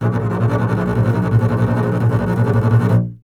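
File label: string contrabass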